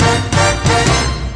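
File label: 176 bpm Mambo Hit loop, horn loops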